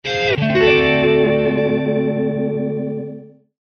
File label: sms guitar